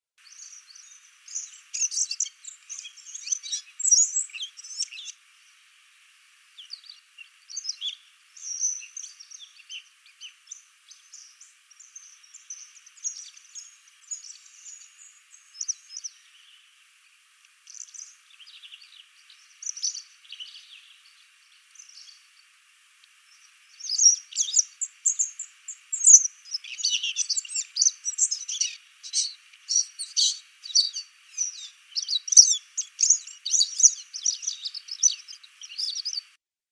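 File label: seraya muholovka golos, spotted flycatcher voice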